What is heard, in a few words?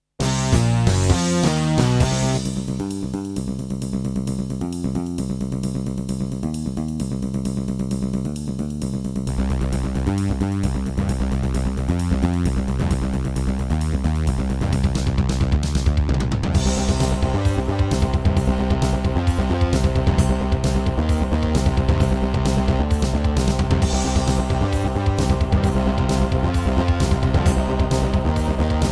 Korg NS5R